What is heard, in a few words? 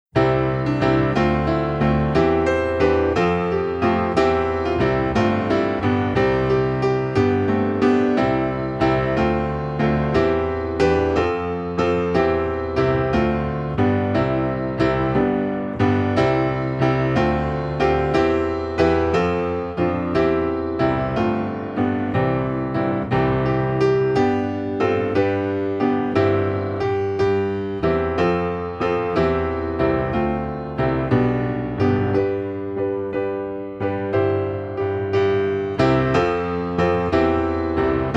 ACCOMP; Gloria